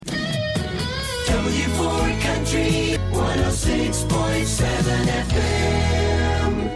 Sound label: FM Country Early 90s Jingle; WWWW-FM; W4